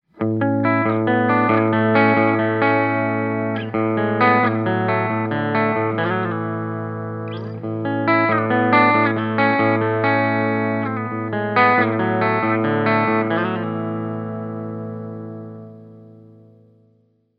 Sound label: clean, humbuckers; foundry